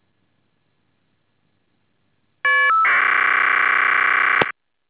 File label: FSK